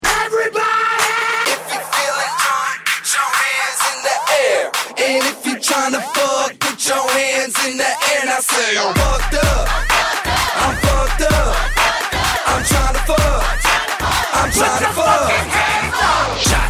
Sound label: bass music system